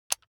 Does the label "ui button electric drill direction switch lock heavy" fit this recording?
Yes